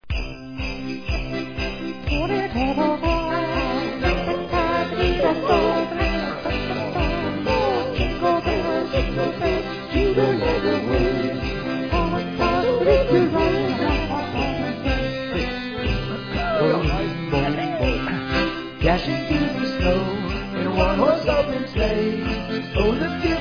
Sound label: Squeezebox Jingle Bells